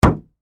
Impact Plastic Dull, Hit; Miscellaneous Impact

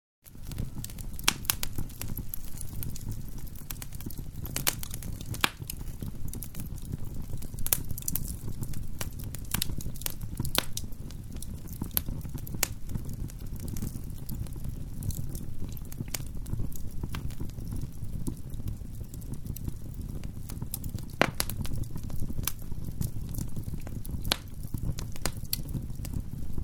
Burning open coal fire; Fireplaces (Sound Effects:Household)

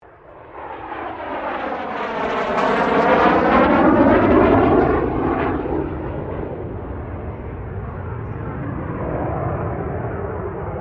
AIRCRAFT CA-27 SABRE PASS STEREO; CA-27/F-86 Sabre; Airplane; Airplanes; Vehicles

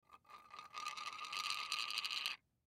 Toy Cd Player Spin, Toy Cd Player Spin Disc; Foley; Miscellaneous Cartoon; Blastwave

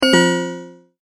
multimedia tone error

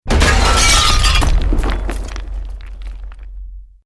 EXPLOSION MEDIUM BRICK WOOD GLASS NO FIRE

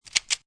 Load, Gun Handling; Guns; VRSonic

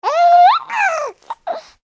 Baby Happy Vocalization; baby 3 months; Babies (Sound Effects:Voice); McKinney Sound

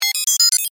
Data Musical Readout, Scifi; Tech; BlastWave

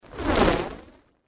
Fireball Whoosh, Fire Whoosh Pass By Small; Flames; Sound Design; Flame Sound Effects

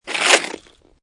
Snow And Ice Ice Slide Pass By; Weather

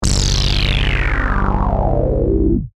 Synth, Stab, Sweeper, Transition; Production Element, Imaging Element, Accent; BlastWave

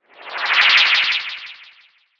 Magical Whoosh Chopper; Magic sound of casting spell, conjuring or wizardry. Witchcraft ritual summoning elemental or otherworldly

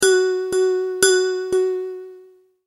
Multimedia Tone-Thinking/Processing; Sound Design; McKinney Sound

